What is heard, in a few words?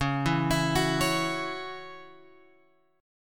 C sharp Minor Double Flat 5th C sharp, chord; C#mbb5 Chord {9 7 X 9 7 9} arpeggiated